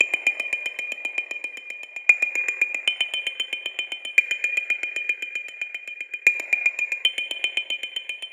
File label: 115 Dmaj Seq Glasses; Sequences; Zero-G; Future Wave